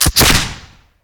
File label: Gun, Musket, Single Shot; gun and weapon sounds; captain audio; fully loaded